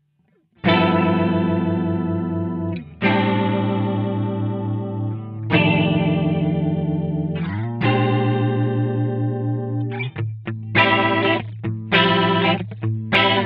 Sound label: vibrato